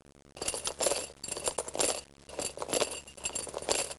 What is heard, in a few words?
Chains Rattling